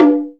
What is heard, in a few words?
percussion, Ethnic Perc